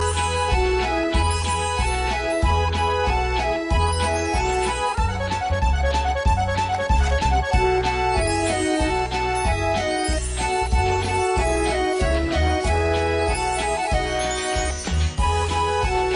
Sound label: Christmas Song